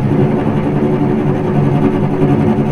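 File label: cellos tremolo D2 piano right, string; East West - The Ultimate String Collection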